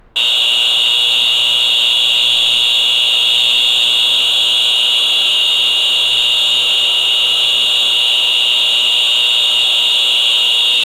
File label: the sound of a fire alarm blaring loudly; safety